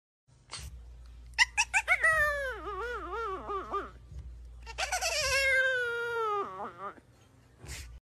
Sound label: dog howl